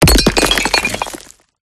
designed impact brick rock dirt gravel designed multi lfe; PMSFX